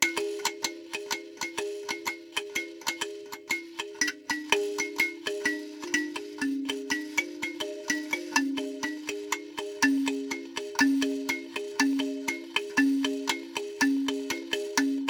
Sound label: Kalimba